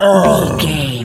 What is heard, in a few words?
zombie voice grunt